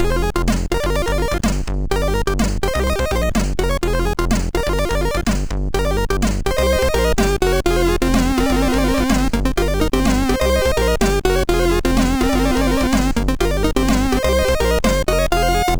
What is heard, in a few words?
Intro, sid, MOS6581R2